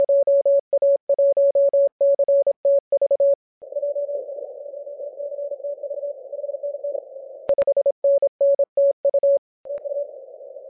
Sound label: 28mhz